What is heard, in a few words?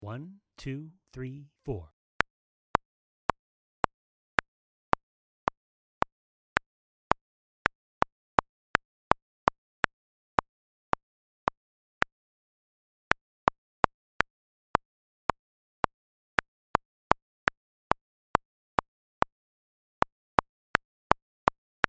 Maniacal Metronome no kick